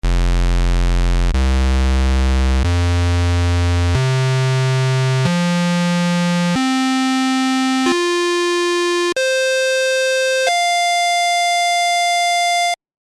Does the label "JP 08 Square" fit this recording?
Yes